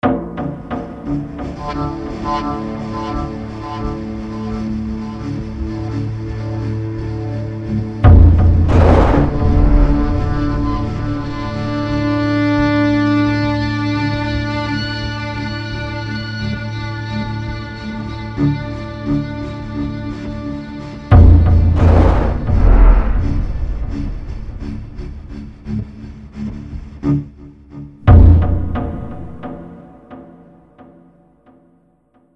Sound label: stinger; inFamous; Play Station 3